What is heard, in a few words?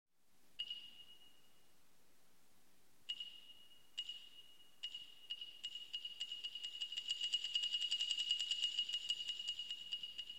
Adagio, From Music For Strings, Percussion and Celesta